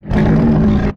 WAR IDLE; LockJaw; Mob

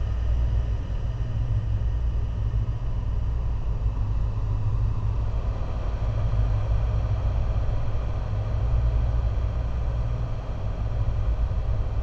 Altar, ambience

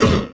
Gunner damage

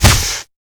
gun Shell, Damage

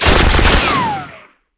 Mgun Skin

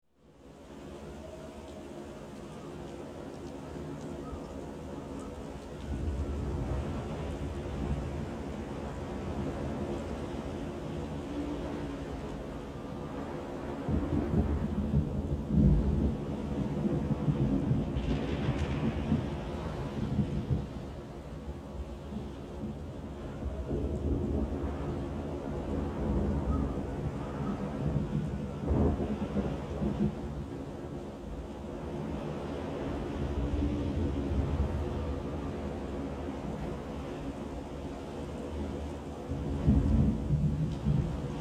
Rain

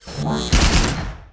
WAR ATTACK, Mob; HOBOTURNPIKE

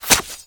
Knife Shell, Damage

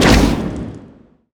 Crossbow Exploding Cast; bullet

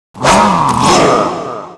WAR ATTACK

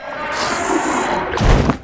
war die; Gramble

